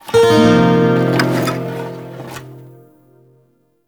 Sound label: Shawzin Un Equip; Warframe; Lotus Shawzin